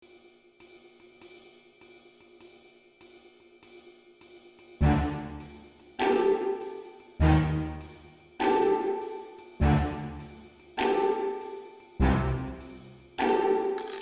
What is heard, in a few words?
Dungeon